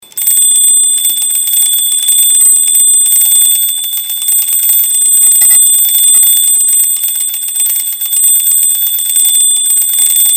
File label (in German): weckton; deutsches uhrenmuseum